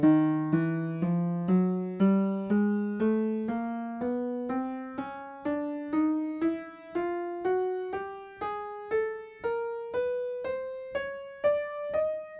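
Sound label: piano chromatic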